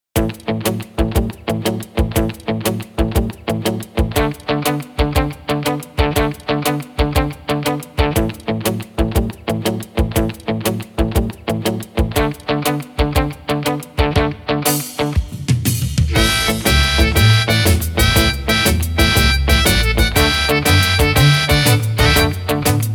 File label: Cactus Blues